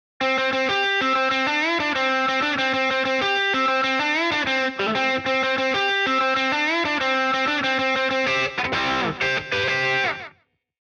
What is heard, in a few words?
Lead Delay On